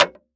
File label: Foley Footstep High Heels Jump On Wood Boardwalk Single; Foley Footsteps